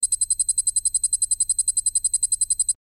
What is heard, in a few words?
Notify Msg